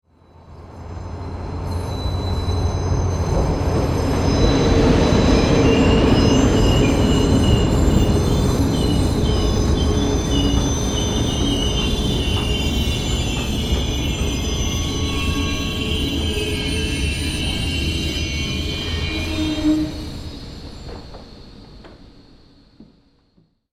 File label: Train brake screech and slow down; vehicle